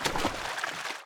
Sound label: slosh, footsteps; player